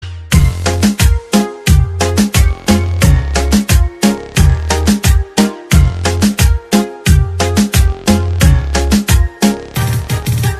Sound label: remix instrument